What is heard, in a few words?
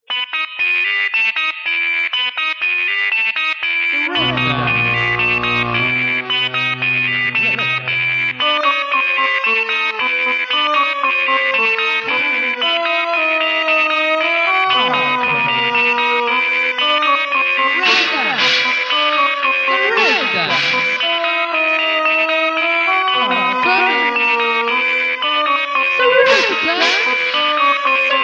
Jingle